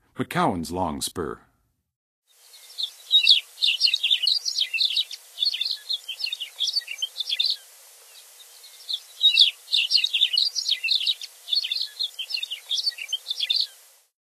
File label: McCowns Longspur, Songs of Birds of North America; Western USA; Western USA Songbirds